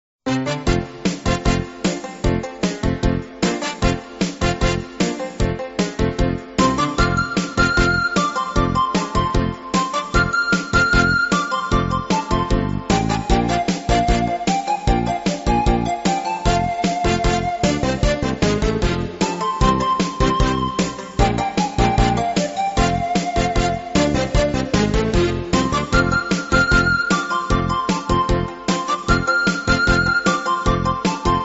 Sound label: Panpipes